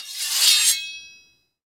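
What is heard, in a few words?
Sword Sheath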